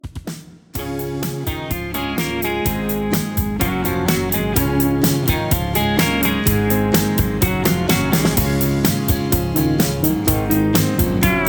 instrumental

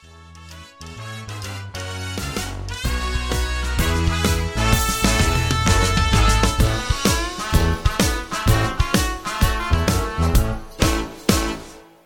Jazz Instrumental

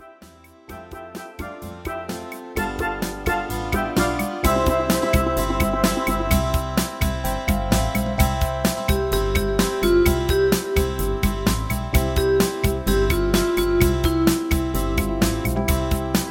Instrumental

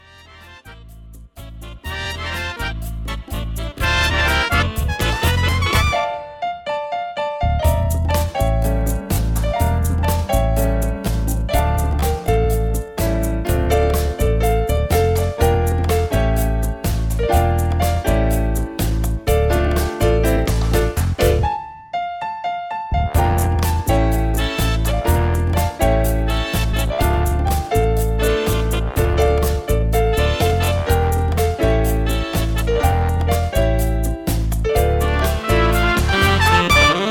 Low Key

